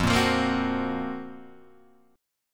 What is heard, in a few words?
F sharp Major 7th Flat 5th F